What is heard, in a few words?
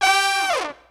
HIPHOP HORNZ; Kid Nepro - Elite